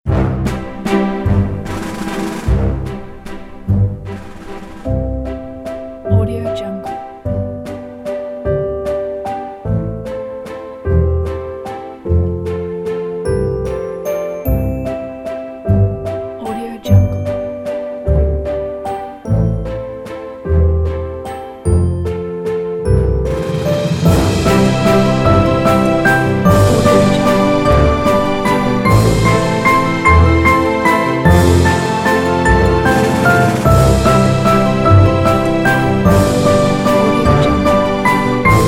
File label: classic graceful ballroom waltz